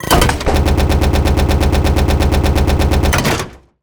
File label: steampunk mechanical gear chain element start stop; Bluezone Corporation